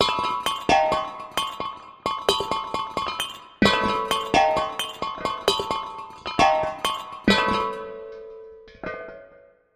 PLUMB HIT; eShop

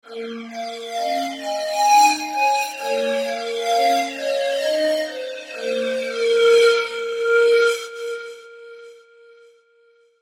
PAN PAN; eShop

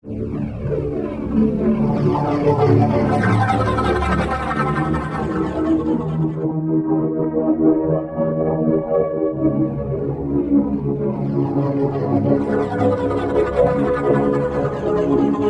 PEN TUBE all; eShop

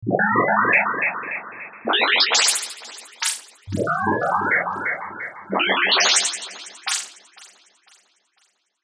ZOOM; eShop

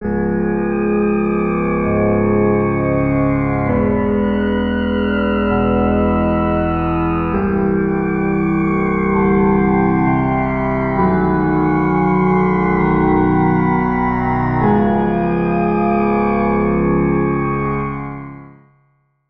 HARMO DRONE alt; eShop

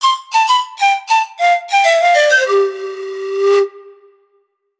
andes pan

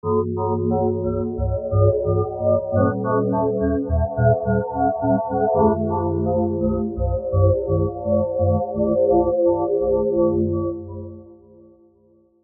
PRIME PULSE

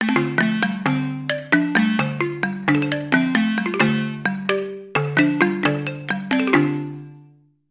Balafon

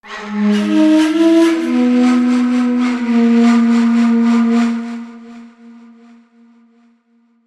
SHAK VIB; eShop

